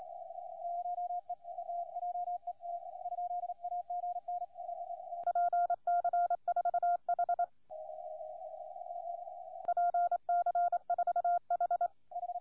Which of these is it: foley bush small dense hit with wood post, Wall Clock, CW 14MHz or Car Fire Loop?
CW 14MHz